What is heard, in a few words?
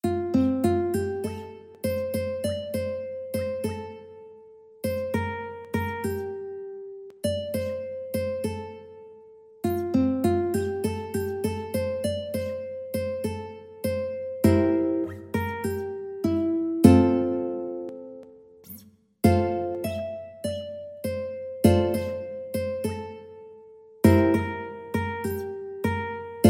ukulele